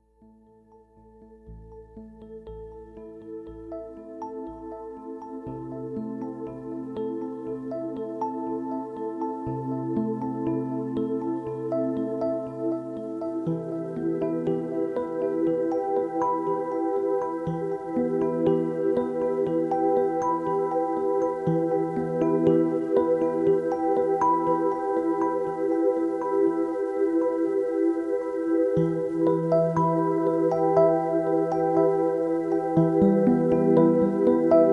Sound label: relax chill out music for landscapes under water animals forests